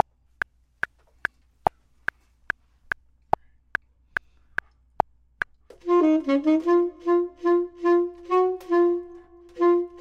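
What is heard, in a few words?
bottom, Saxophone and Clarinet; voxman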